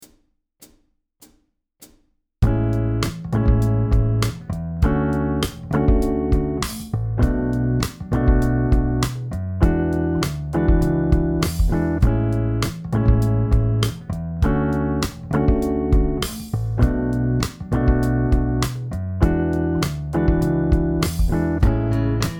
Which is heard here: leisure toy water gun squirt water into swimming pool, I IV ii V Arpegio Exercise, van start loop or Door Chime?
I IV ii V Arpegio Exercise